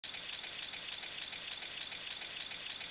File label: rifle idle; sprifle; weapons